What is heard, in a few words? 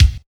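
ACTION kick; Drums In Your FACE!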